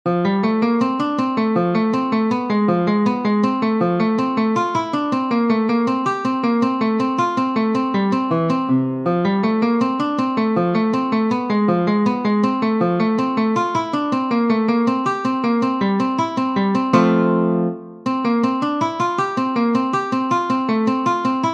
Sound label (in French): Poco Allegretto, guitare; Ferdinando Carulli; Op. 241 Méthode pour la guitare